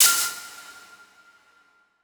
Title Transition Laser Short; Adobe; Production Element

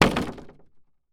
Impact Wood Drop On Wood; Impacts

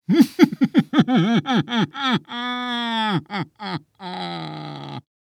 Horror Scary Human Male Laugh Evil Vampire Laugh

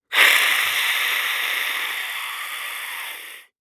Horror Scary Vampire Hiss Angry Growl Hiss

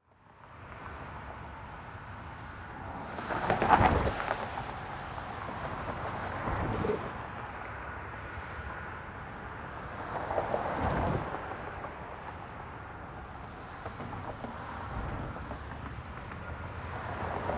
Ambience Traffic Over Railroad Tracks Busy; AdobeCC

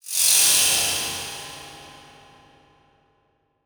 Transition Delay Medium

